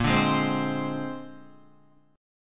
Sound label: Bb 9th Bb down Guitar 4F,4A,5C,5G,5C,6E; strummed; JGuitar